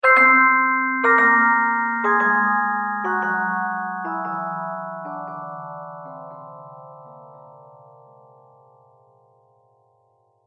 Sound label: Ta-Da Chimes With Delay; Cartoon Music